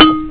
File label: Low Beep